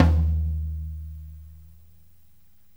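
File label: floor tom; DRY KIT; Spectrasonics; Burning Grooves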